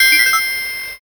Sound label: ice magic